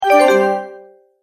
multimedia tone alert; Tones; Sound Design; McKinney Sound

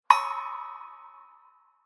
Clang; Impact / Explosion Sound Effect

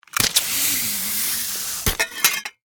Warfare Grapple Gun Shoot Impact; Miscellaneous Combat; SFX Bible

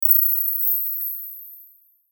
Pixie Dust Soft Pour High; Magic; Blastwave

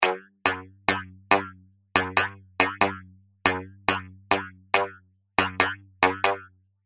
140 Bpm Droid Boogie Loop, Robot; Looping

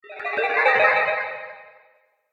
Spell Water Illusion, Magic Sound Effects

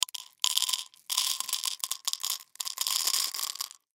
Pill Bottle Small, Pill Bottle Pills Empty Fill; Clicks; Foley; Blastwave

